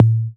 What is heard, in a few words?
Tom Analog Drop; Drums